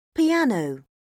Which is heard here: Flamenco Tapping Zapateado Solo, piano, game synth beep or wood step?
piano